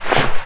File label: Clothing; Player; Footsteps